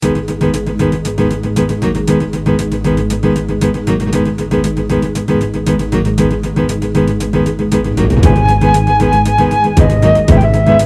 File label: classic Desert; Siralim Ultimate